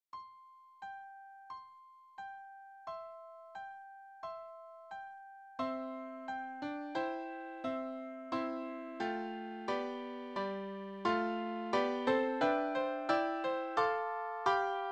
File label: easy piano; He Is Risen